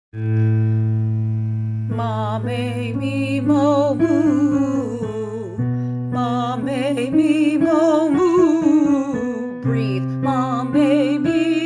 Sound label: mah may mee moh moo vocal